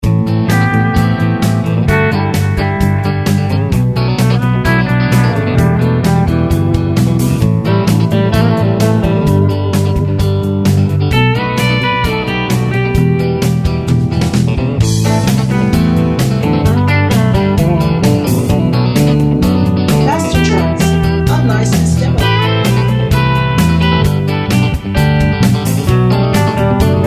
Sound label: Country train 130bpm; MASTERTRACKS